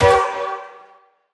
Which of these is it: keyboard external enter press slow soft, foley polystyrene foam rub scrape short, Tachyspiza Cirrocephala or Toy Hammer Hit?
Toy Hammer Hit